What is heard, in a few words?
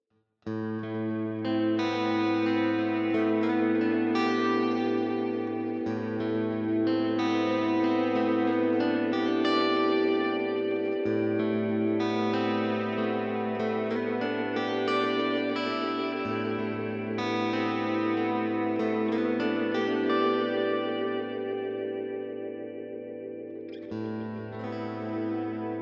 spring repeats; strymon magneto; 4dlyheads evenheads